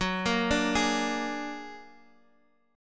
A sharp Augmented 7th F sharp, guitar

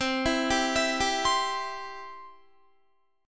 C Major, Guitar; arpeggiated

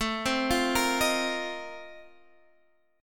A sharp Major 7th Suspended 2nd Suspended 4th A

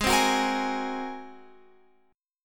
G sharp 9th G sharp Guitar Open F; G#9; strummed

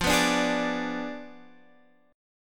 F Diminished 7th F down Guitar Standard; Fdim7 strummed; JGuitar

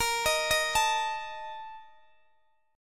Bb Major 7th Suspended 4th, Guitar, DADGAD; x,x,20,20,18,19

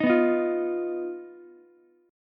D sharp Suspended 2nd Sharp 5th D sharp Ukulele; strummed; JGuitar

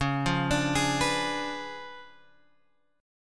Db 7th Flat 9th Db, down, Guitar Open F; Db7b9; arpeggiated

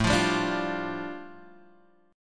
A 7th Suspended 4th Sharp 5th, A, down

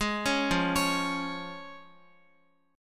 F Minor Sharp 5th F down Guitar; arpeggiated; tuning 4E,4A,5D,5G,5C,6F

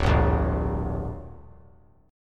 D Suspended 4th Sharp 5th D down, chord; strummed

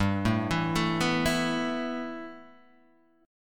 E Suspended 4th G; Esus4/G arpeggiated

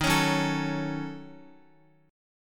D sharp Minor 7th Flat 5th, Guitar; D#m7b5 strummed; x,x,3,6,6,5; 4F,4A,5C,5G,5C,6E

